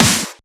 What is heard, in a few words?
Snare; FLPRO; Snares